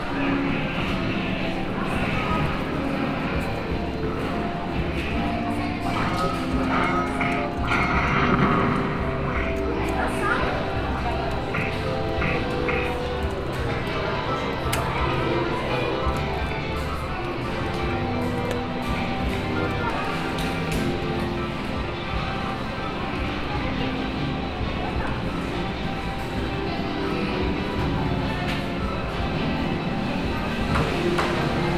am Flipper; Fieldrecordings; Brasilien

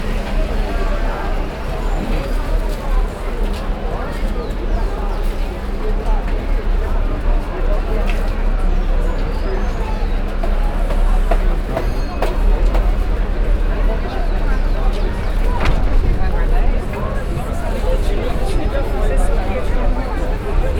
Auf der Strasse, Fieldrecordings Brasilien

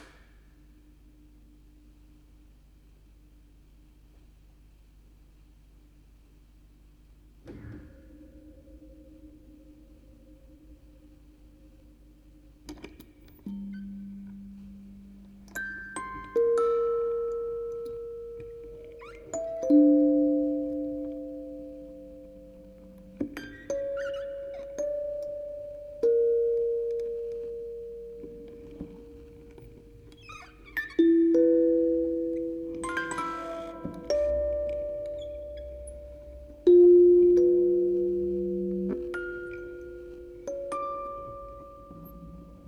Aufnahme Spieluhren Quartett 4 Kanal stereo

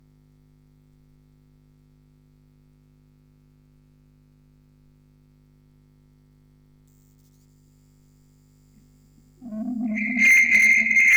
Aufnahme Vocoder, Spieluhren-Quartett; Tonband; Fieldrecording

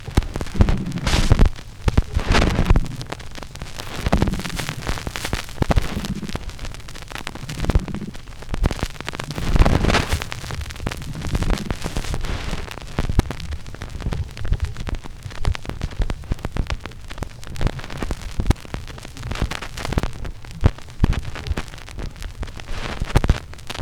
schallplattengeraeusche mix, rueckwaerts

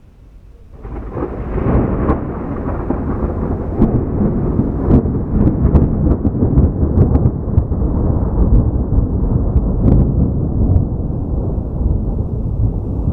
Gewitter; Fieldrecordings; ca 3 Uhr morgens

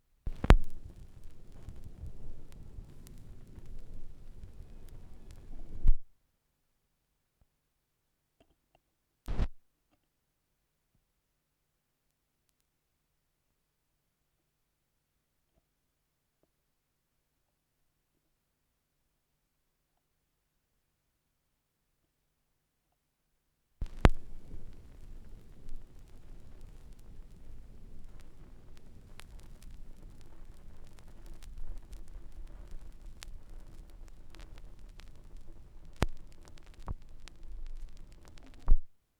schallplattengeraeusche

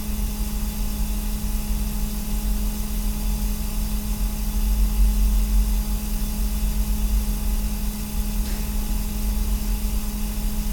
kuehlgeraet, verkehr; Fieldrecordings

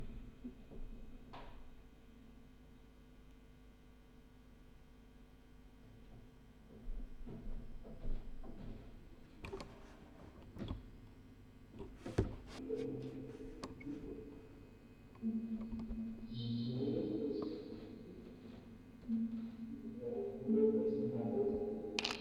Aufnahme Spieluhren Quartett 4 Kanal stereo; Tonband, Vocoder und Fieldrecording

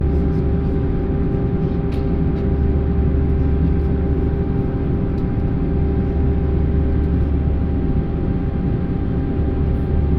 Flug; Brasilien; Fieldrecordings

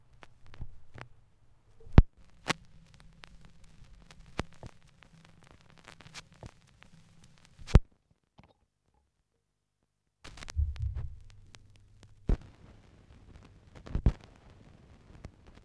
schallplattengeraeusche, Mix

